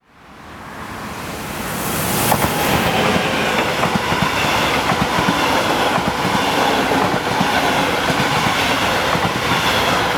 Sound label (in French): trains diesel et electriques; sonotheque